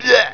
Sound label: Eye Die; QuadMire 1.0; Quagmire